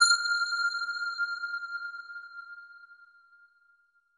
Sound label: ufo spotted; geoscape